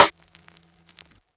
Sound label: snare; dough-samples; eddyflux